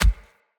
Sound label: Step Default, Animals, Foley, Steps; Bromius